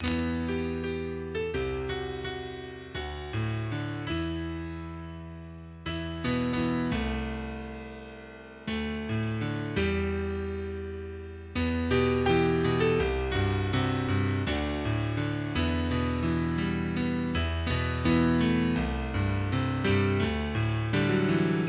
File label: Piano